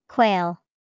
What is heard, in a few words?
Quail